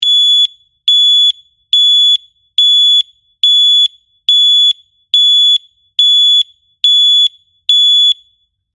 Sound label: Tone